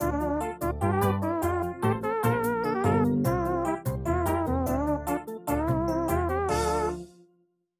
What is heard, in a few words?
Elevator Jingle; lethal company